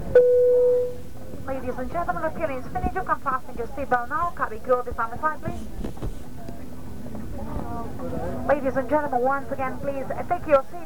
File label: Paris Bcn Flight